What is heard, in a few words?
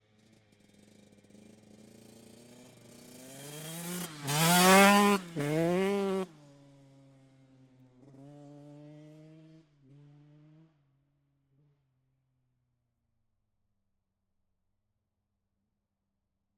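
TM 125, Exterior, By, Gearshifts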